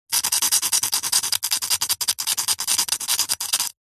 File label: stone rock carve engrave scratch fast with small sharp metal spike; foley